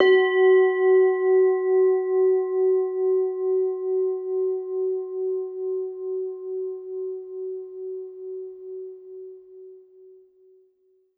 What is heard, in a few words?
MIXED BELLS + BOWLS; World Instruments 2; WORLD PERCUSSION